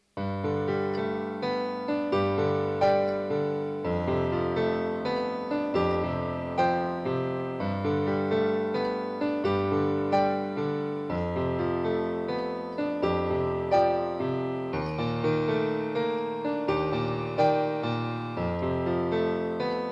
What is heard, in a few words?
on piano